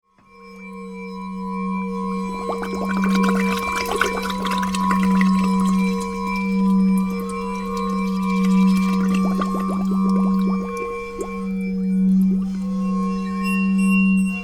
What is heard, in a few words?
glass orchestra